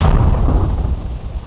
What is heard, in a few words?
BOOM; THEREALM